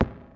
gun bounce; gonner linux macos ps4 switch windows xbox one gamerip 2016

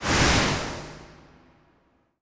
landing; micchi

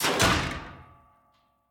Metal Bar Doors Close; The Town

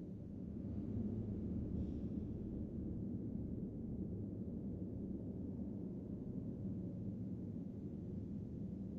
Amb Space race Baseloop

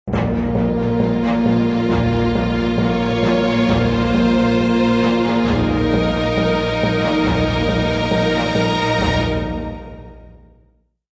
Medieval Agitated; Civilization Revolution; Xbox

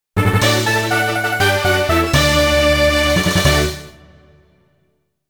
Received Battle Points!; Pokemon HeartGold and SoulSilver Music Super Complete

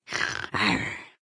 Sleep; Cut the Rope Time Travel

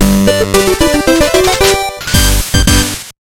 Music Goal; gamerip; shovel knight king of cards

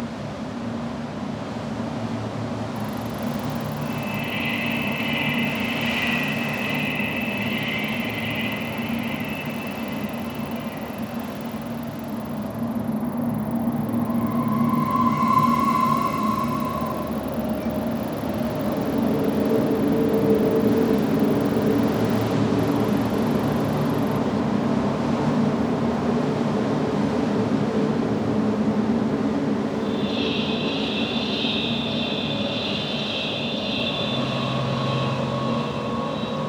ambience default

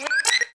bat hurt

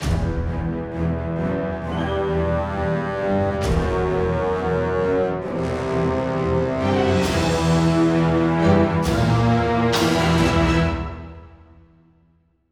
Round Start Halloween

